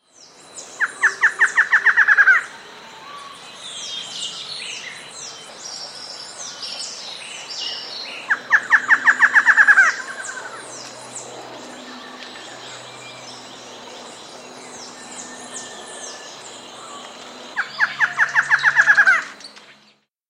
Bar-Crested Antshrike, Nature's Songs, S. America

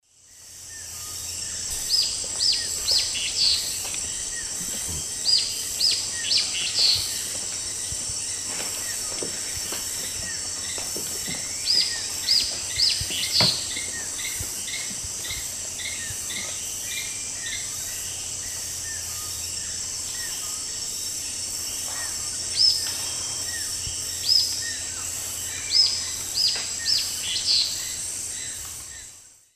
Tyrannopsis sulphurea, song; S. America; Eastern Lowlands

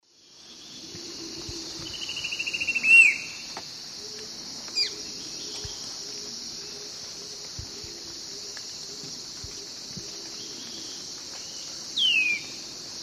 Pygiptila stellaris, song and call; S. America; Eastern Lowlands

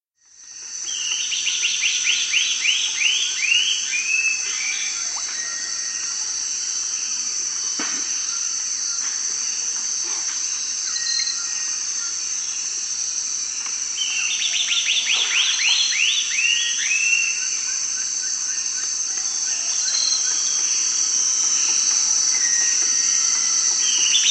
Lunulated Antbird, S. America

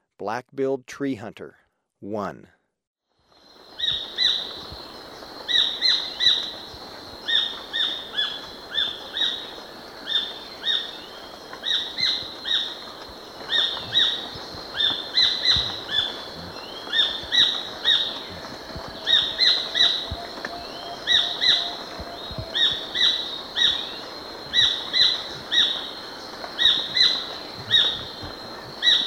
Black-billed Treehunter, Birds of Ecuador; S. America

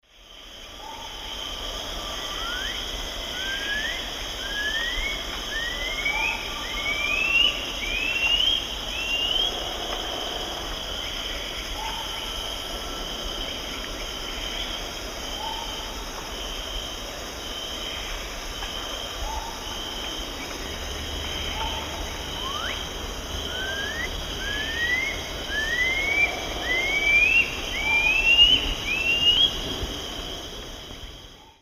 Pipile pipile, song; S. America; Eastern Lowlands

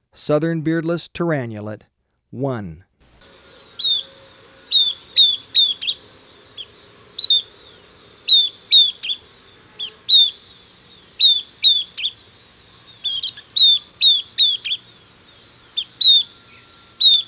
Southern Beardless Tyrannulet; S. America

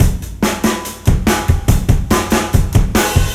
ALT ROCK DRUMS

